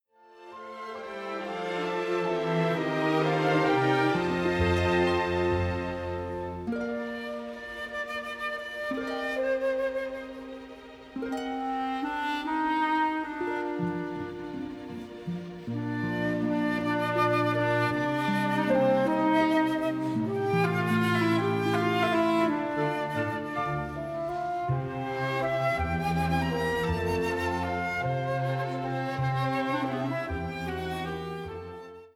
Instrumental

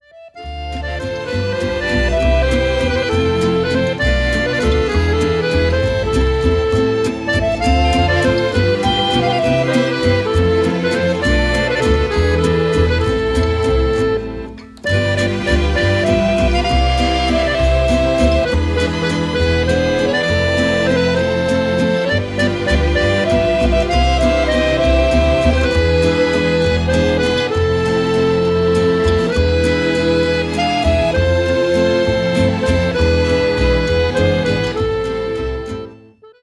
accordion version; CanCan

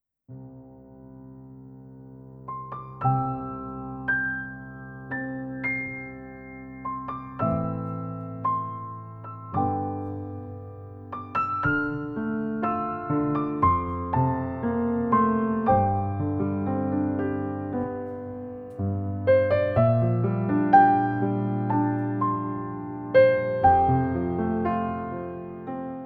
Piano Suite

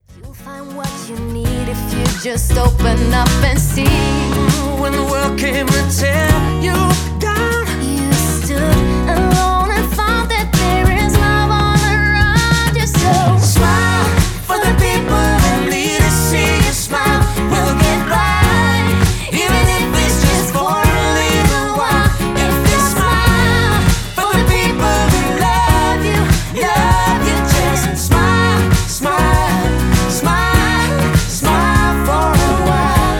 unplugged

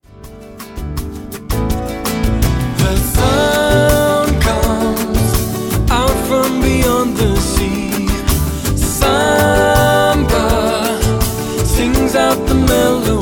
Samba

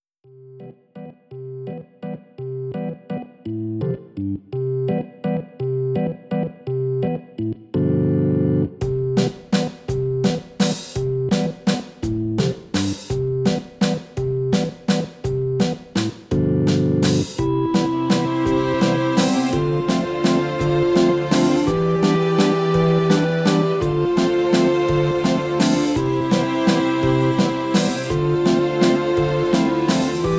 instrumental

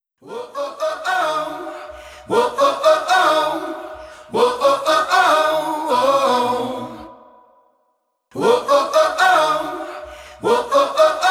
Acapella English

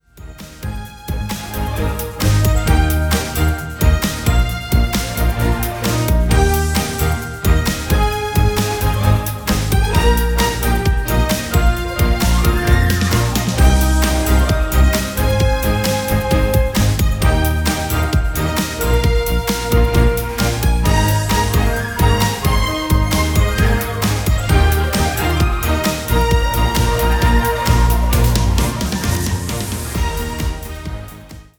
Instrumental

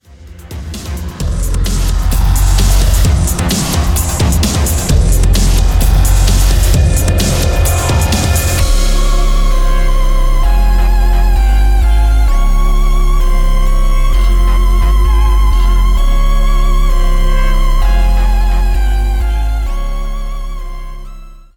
demonic waltz

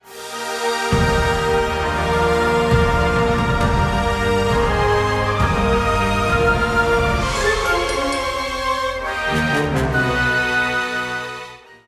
Trailer Music

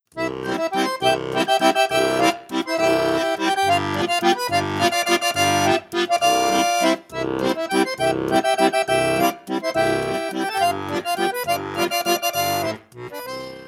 Waltz

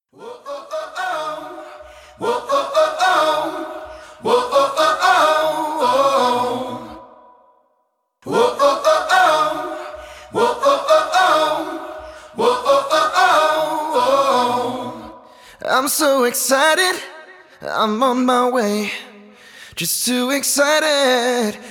Acapella Deu-Eng

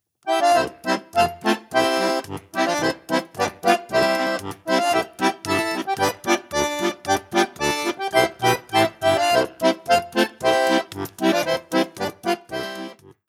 Steamship Polka

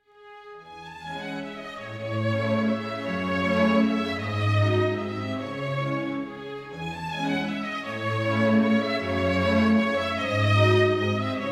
Tempo Di Valse Serenade for Strings E-Dur

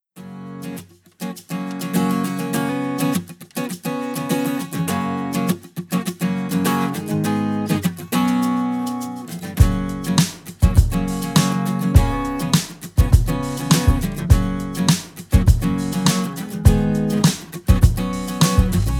Karaoke

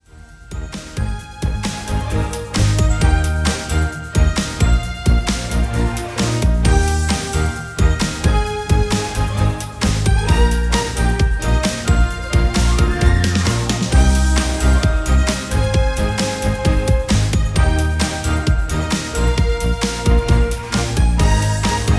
Karaoke Mix

